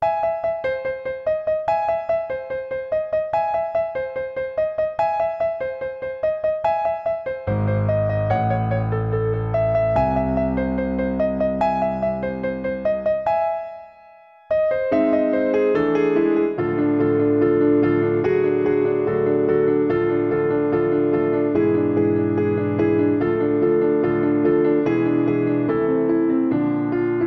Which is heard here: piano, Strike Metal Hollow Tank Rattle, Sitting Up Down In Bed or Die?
piano